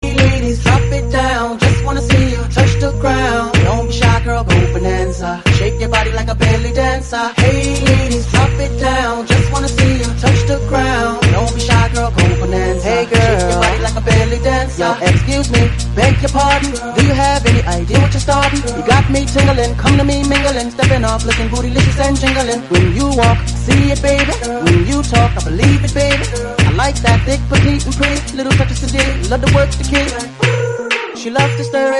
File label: remix english song